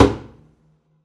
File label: pizz noise body slap, double bass; dsmolken; noises